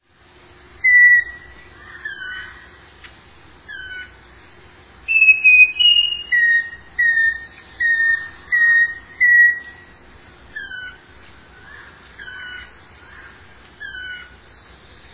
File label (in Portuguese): uirapuru-veado